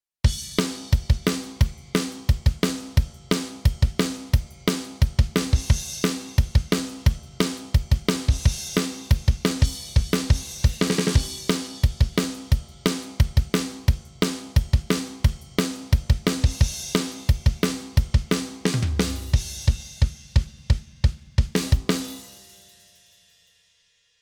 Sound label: drum off